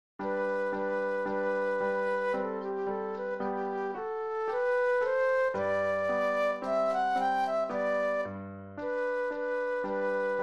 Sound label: Andantino Flute Solo; classical; Haydn; Hob. XVII 8